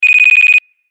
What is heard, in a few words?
Homingbird Lock